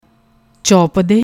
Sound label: chaupade, singing style; Bani